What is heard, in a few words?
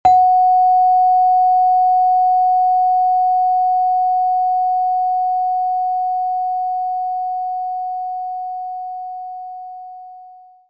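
Celeste F#5 delay; knights try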